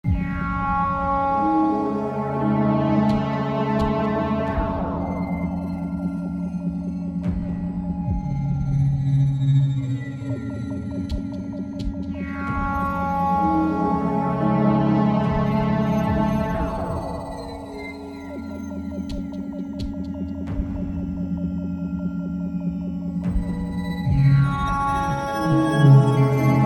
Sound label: riot music; painkiller overdose